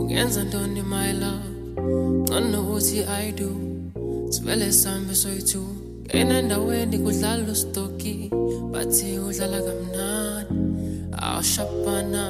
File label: Acoustic Version